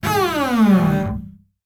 INSTRU STRING Double Bass, Bowed, Slide Down, High Pitch; Articulated Sounds; Special Double Bass Fx